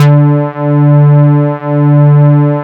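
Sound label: PROPHET BASS D4; Drums In Your FACE!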